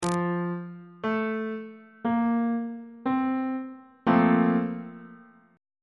b flat major 7th suspended 2nd 2nd inversion on bass clef